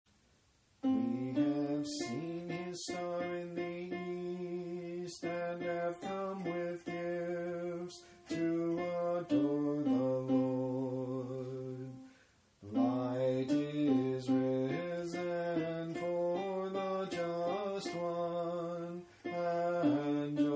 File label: communion antiphon